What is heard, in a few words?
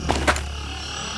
ak47 draw, weapons; ak47buff